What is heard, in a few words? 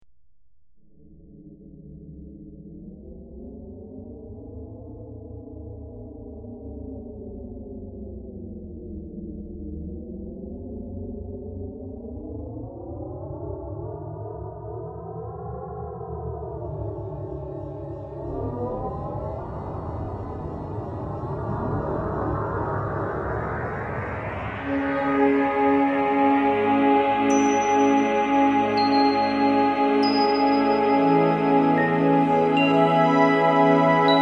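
Synthesizer Effects; Chiller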